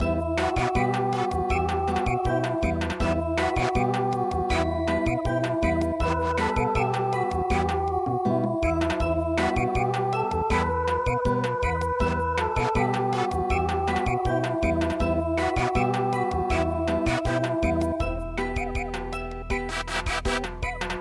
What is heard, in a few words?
canon Samba; traditional